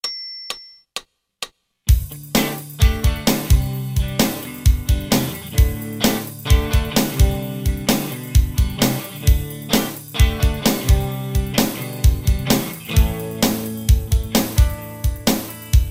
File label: Bell Sound Song